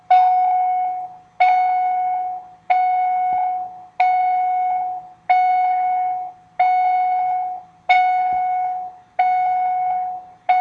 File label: Door Chime; Versailles